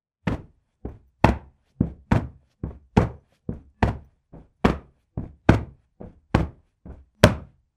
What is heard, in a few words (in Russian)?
zvuk podushki